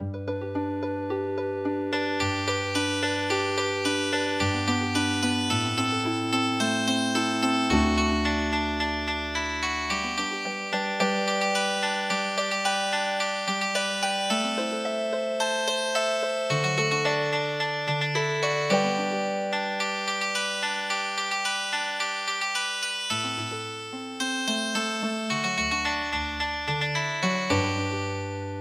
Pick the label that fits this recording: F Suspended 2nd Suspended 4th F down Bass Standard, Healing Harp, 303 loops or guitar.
Healing Harp